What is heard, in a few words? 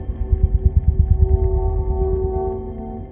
Barnacle Purr